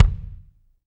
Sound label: BD; Bob Clearmountain Drums I